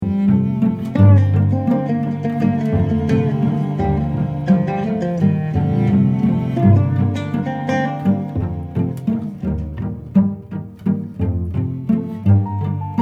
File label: OUTRO MUSIC; Occupied Thoughts; yousef munayyer